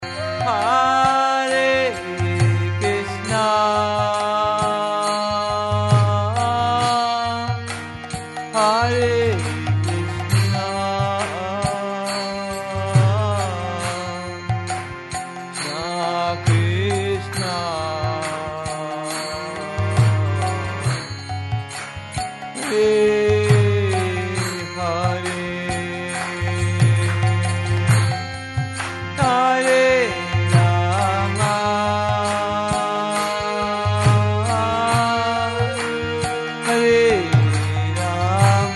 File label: Gratitude melody